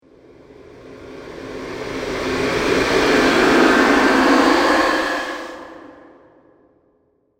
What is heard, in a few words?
Deathly Breath, scary breathing